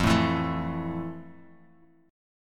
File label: A 5th F, guitar chord